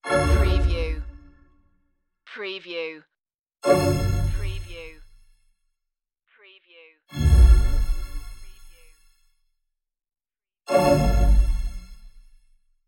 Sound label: deep magic hits